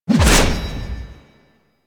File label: Melee Heavy Attack Whoosh; Warframe; Weapons; Whooshes; Heavy Attacks